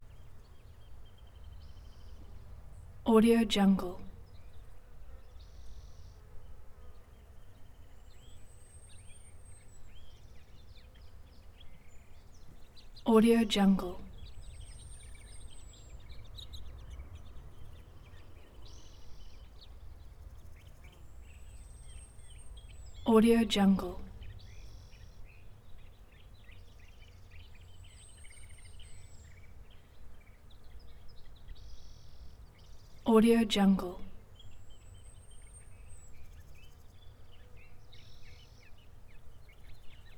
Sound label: san francisquito canyon ambience bugs birds; prosoundfx